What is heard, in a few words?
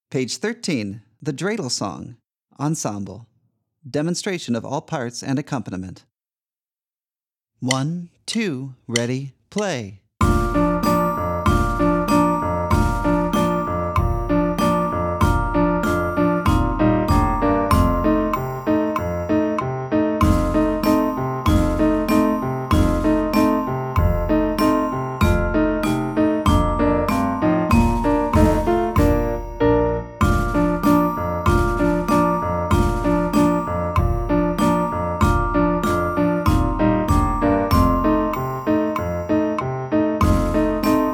Ensemble Demonstration; The Dreidel Song